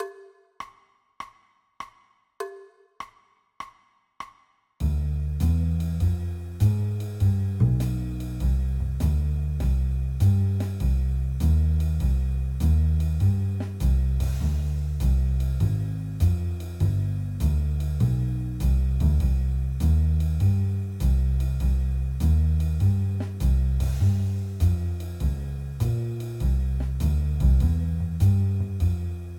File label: Minor 251 Cm Ebm